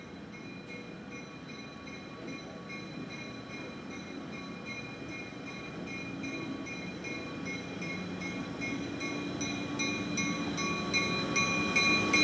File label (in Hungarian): panorama vonat egysavos utcaban halad kolompolva